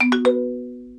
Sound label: test pass; fluke